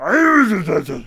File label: Ballista Attack Vocals